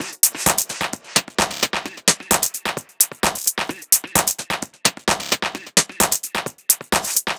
Perc Loop 130, techno

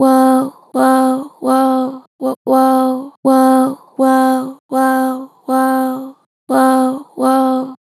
Gen Vib Woah, Vocals, Natural Vibrato Gentle; Harmor Vocals

